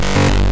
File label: Glitch